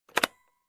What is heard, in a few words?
Suitcase corner opening